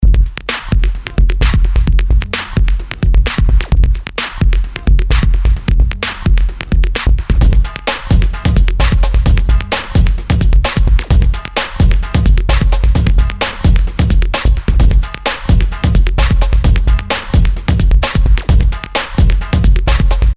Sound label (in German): drum bass klingelton